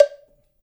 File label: COWBELL; InVision Interactive; Percussion Slam; CAFFEINE